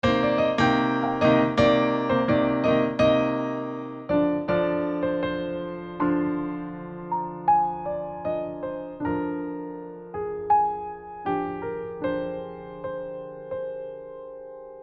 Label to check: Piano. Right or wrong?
Right